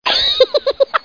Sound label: bug laugh